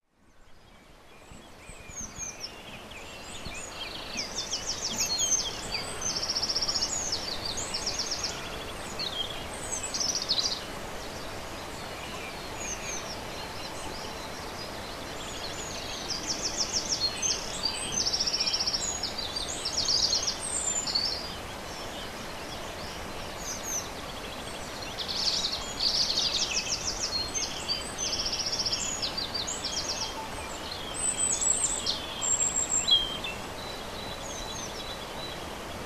rouge gorge; mz-n710

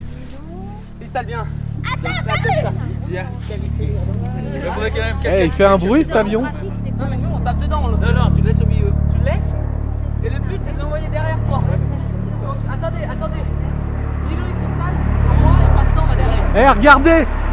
helico

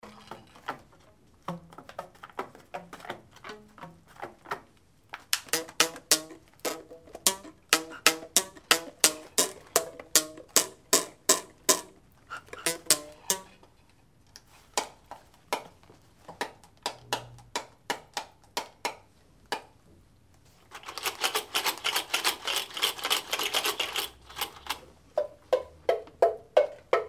mini orchestre; prevert

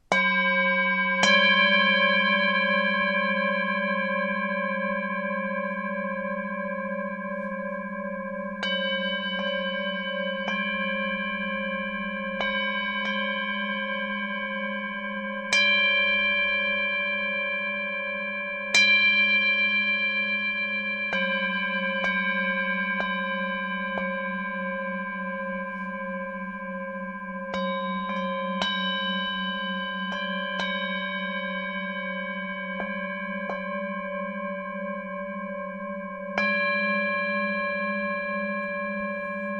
cloches

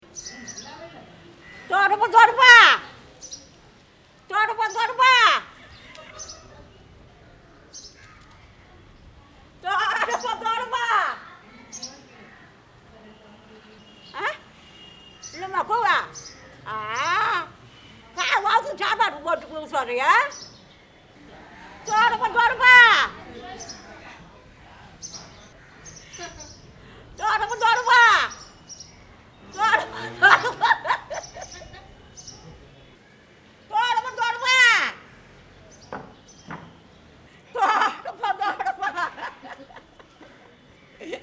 balais rires

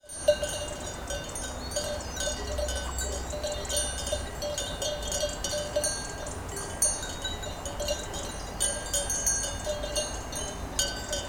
Cloches; Cloches Patou

